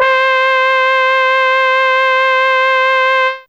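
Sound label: Trumpet C4, Fairlight CMI IIe Brass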